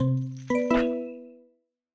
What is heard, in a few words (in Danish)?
SMS sendt; Alexander Vienberg; audio branding for laenken